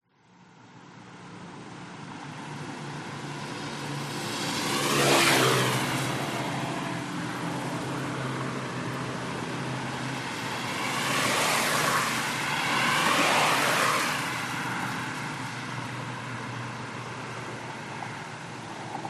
traffic pass by wet road super highway, thailand chiangmai